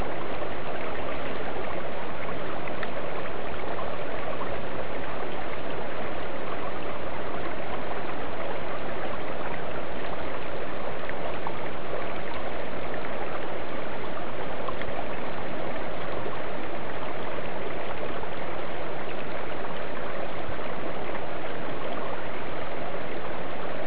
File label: water; yaita (tochigi)